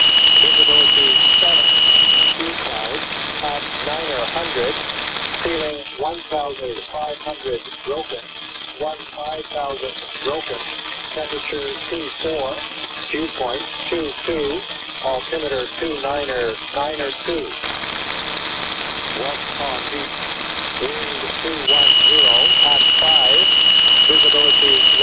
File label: high hiss 3000